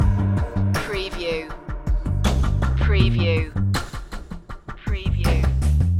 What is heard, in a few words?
quietly confident loop